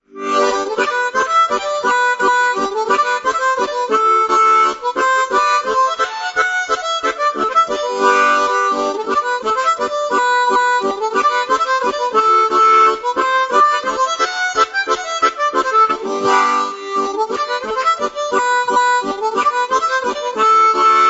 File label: Mazurka